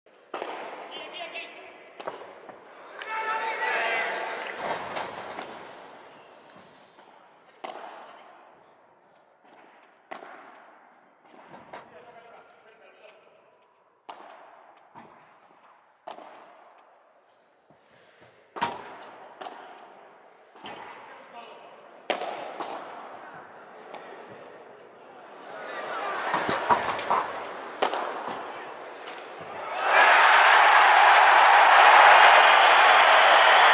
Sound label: Padel; ورزش پدل